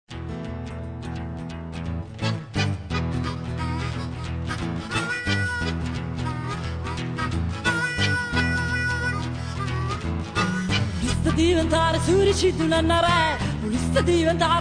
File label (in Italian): Musica